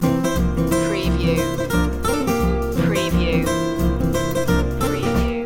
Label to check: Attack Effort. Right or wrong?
Wrong